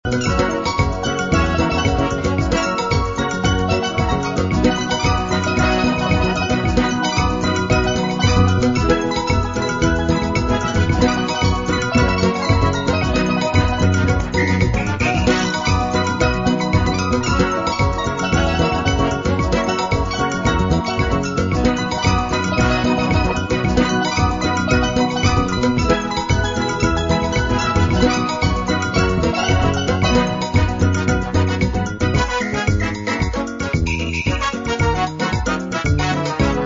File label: Samba